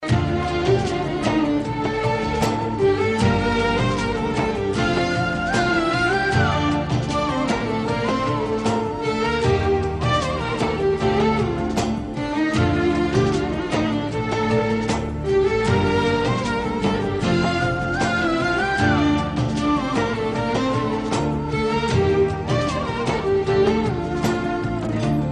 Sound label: Old And Nice Pop